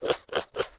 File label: Pawn Pigskin Call